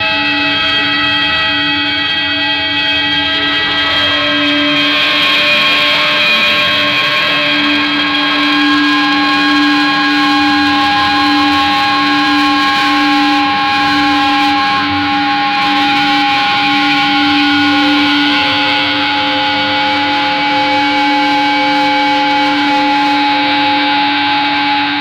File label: a sharp and harsh inharmo; apocalyptic